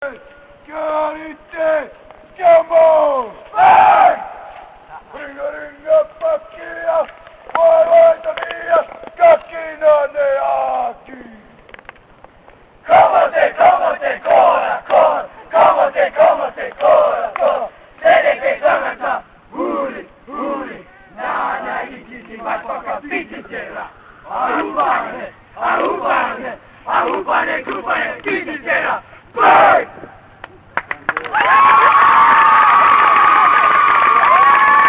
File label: Rope Burn Chant; Hyperion Gold